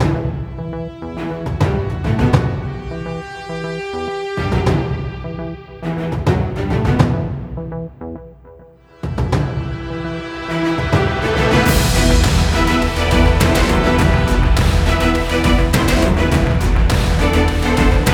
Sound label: tension; by burak sound bank; tension gerilim vol 44